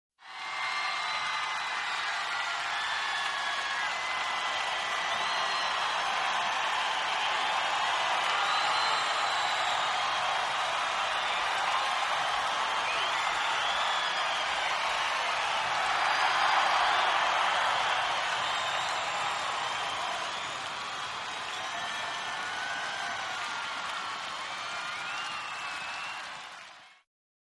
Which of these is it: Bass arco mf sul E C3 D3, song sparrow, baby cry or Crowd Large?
Crowd Large